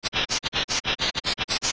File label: Shock; Link getting electrified (3 samples of randomly-generated sounds); zelda; OOT